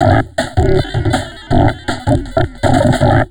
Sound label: 160, Drum Loops Crazy Processed